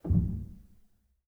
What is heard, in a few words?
rel, Grand Piano; bitKlavier Grand; Piano Bar